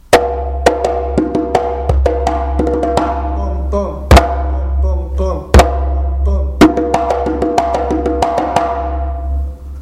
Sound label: Break Djembes; Alarach